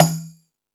Jungel Percussion, E-MU Pro-Cussion; drum machine

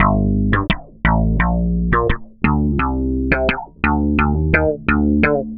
Processed Slap Bass, Electric Bass

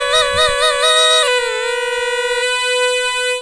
PUNGI; USB Soundscan vol.45 - Indian Traditions; AKAI

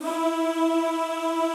E3 R, Classical Choir; Best Service; Extended Classical Choir

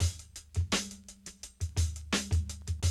Classic DrumLoops

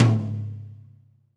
Tom, Akai MPC500 Q2 Kit

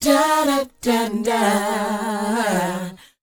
DOWOP D#; ILIO; Vocal Planet

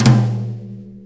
Brush Tom; Sonic Foundry; Loops & Samples Drum Tools; Brushes

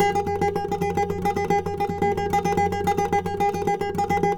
NYL TR; Spectrasonics; Hans Zimmer Guitars VOL-2; FLAMENCO FX

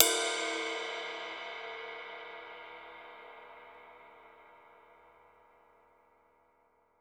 RIDE, Drum Dry; East Collexion; Drum 1 Dry

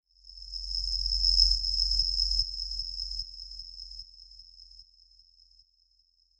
fx crikets of the night

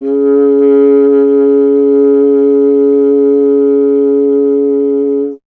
F. Horn; Miroslav Vitous; Solo Instruments

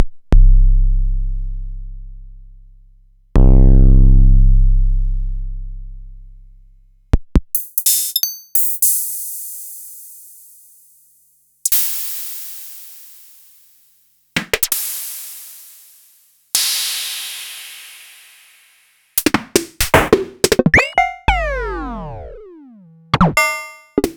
MAM ADX-1

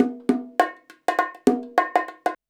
BONG, BONGO 100, Modern Percussion Loops; Soundscan vol.56; AKAI

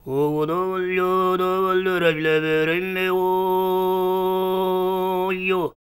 SING, TUVAN MID; Spectrasonics Vocal Planet CD5; World Voices

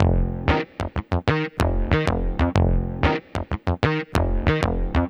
Snappy, Bass; Sonic Foundry (Sony Creative Software); Synchro Funk

